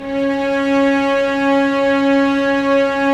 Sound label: STR VC PP, Vcs p Amb